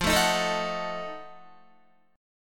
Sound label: F 7th Flat 9th F down Guitar; F7b9; strummed